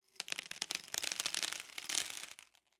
Food Nuts Set Down On Paper Plate Long

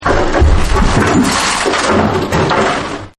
Chaos, Monster; Crunches; Monsters; Sound Effects:Horror

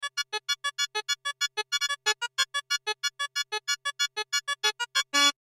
March Fast High; Musical; Cartoon

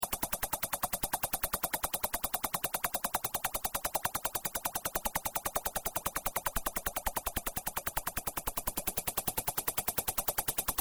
SCI FI MECHANICAL LOOP; Various Sci Fi vehicle effects; Spacecrafts (Sound Effects:Science Fiction)

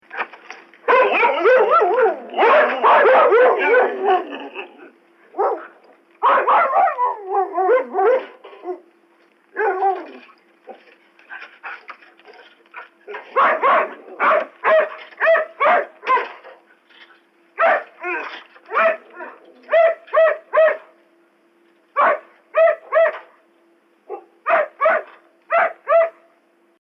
Dogs Huskies Bark Vintage; Dog Sound Effects; Dogs (Sound Effects:Animals)

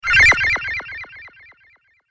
Frantic Data Shimmer; Communication Sound Effects; science fiction sound effect

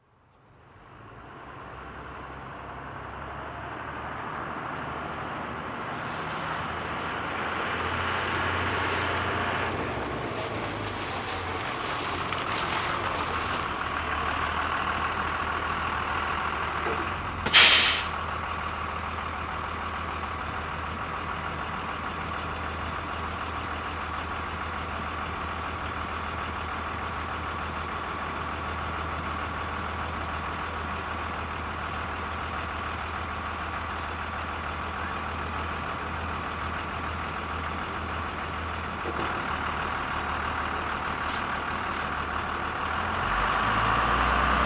School Bus, Pull, Up, Stop, Away; Transportation, Automobile; BlastWave

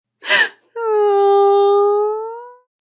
Female Sad Sound, A female sobs or cries; Crying; Voice

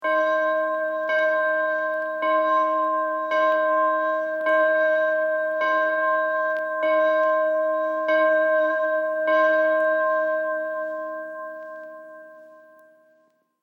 Mission Bell Tolling; Bells; Musical

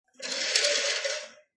Slide Curtain; Window Sound Effects; Household

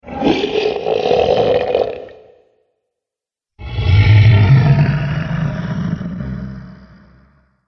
Dragons, monster; horror

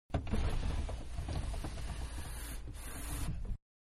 Van-Close Window-Interior; Cars; Vehicles; Manhattan Production Music

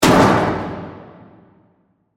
Hit Impact; Production Element, Imaging Element, Accent, Transition; Miscellaneous Impact

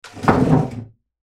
Chair Slide Tile Floor; Offices; Foley; SoundEffects-Blastwave

